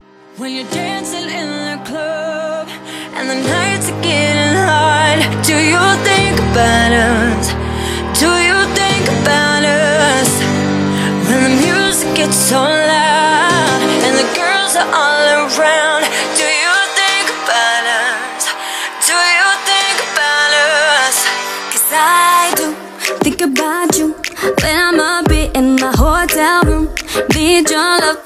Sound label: hollywood english ringtone